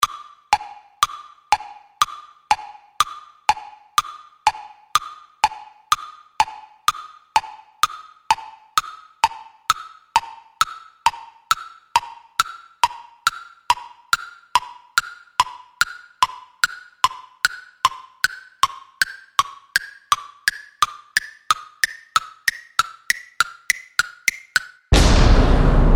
Zany Time Bomb, Comic style countdown tick tocking of clock that speeds up and rises in pitch for; added urgency until it explodes; Bombs; Explosions